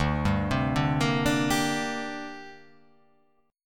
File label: D 7th Suspended 4th Sharp 5th, down